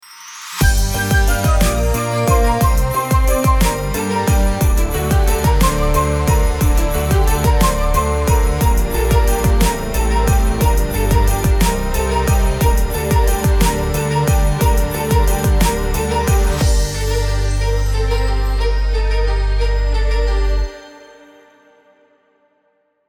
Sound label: carol of the bells xmas background hip hop music; Felices Fiestas; Navidad